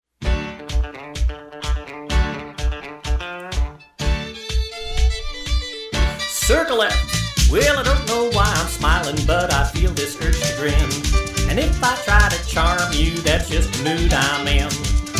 vocal mainstream, square dance

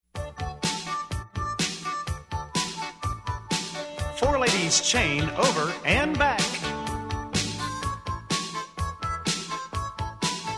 little rock n roll angel vocal, square dance

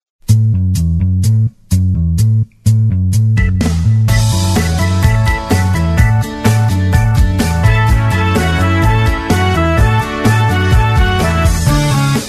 vocal, square dance

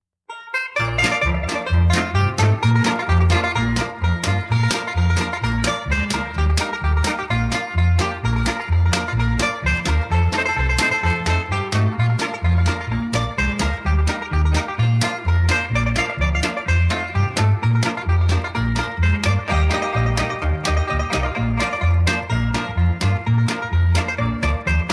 banjo

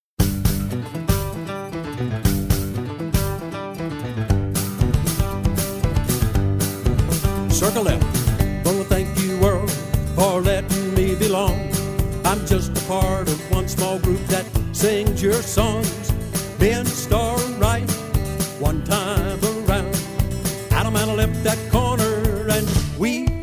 vocal

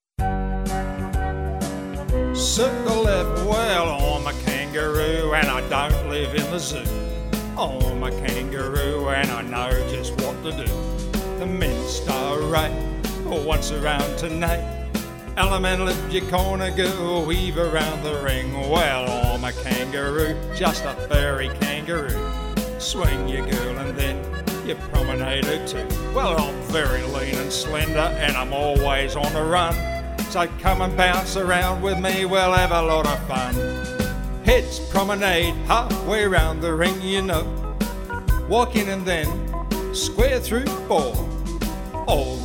kangaroo song aussie vocal

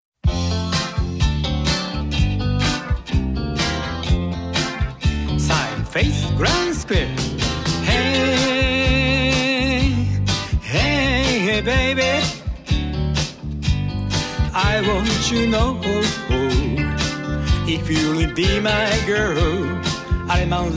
vocal

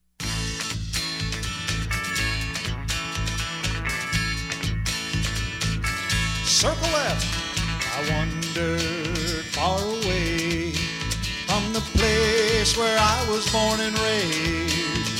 delta dirt vocal, square dance

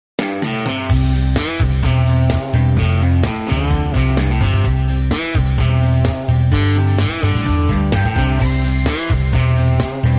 with leads, square dance

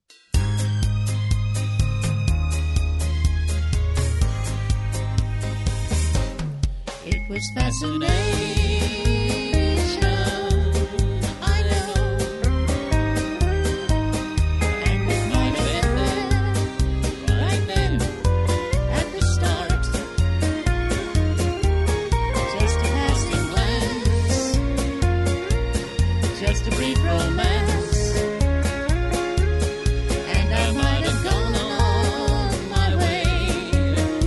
dual harmony

